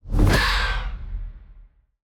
Unstable Bat Player Found; TANK; 3Souls

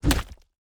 ui construction plan place

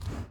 mob release

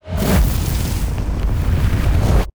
Fire Elemental Fire Flash Cast; TANK; 3Souls

